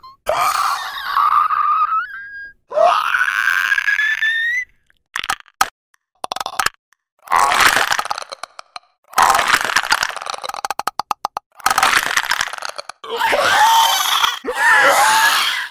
clicker; The Last of Us Part II

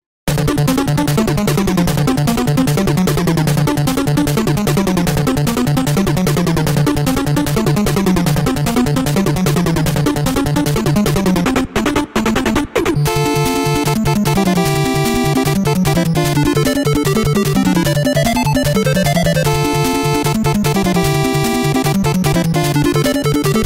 mmsoundtrack; Mega Man 2; gamesoundopedia

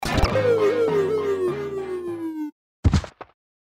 dying; olimar; gamesoundopedia

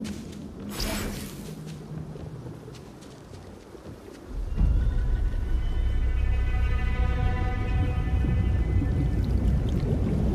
dramatic positive; ori and the blind forest

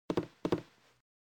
footsteps wood; baldursgate

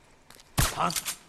shot plus enemy alert

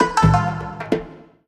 tab religion muslim; europa universalis iv

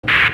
radio turn off; We Were Here Forever

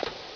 step; Metal Gear Solid; gamesoundopedia

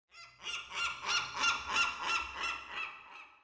seagull; Warcraft III Frozen Throne

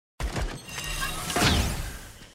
snipe; risk of rain 2

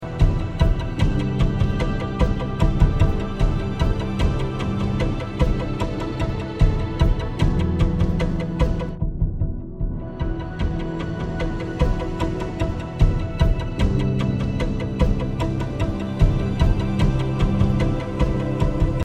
crossroads encounter; hollow knight

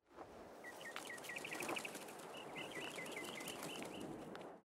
birdflyaway; gris

